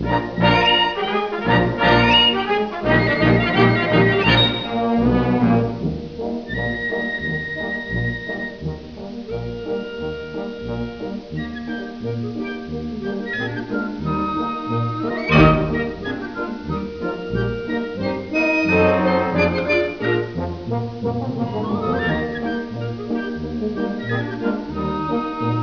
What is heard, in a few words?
piccolo, cornband